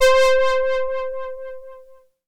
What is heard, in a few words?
Prophet 5 lead wavey high - C; One Shots; Cymatics; Fantasy Synth Sample Pack